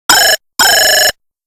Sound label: robot cry; klingeltonpiraten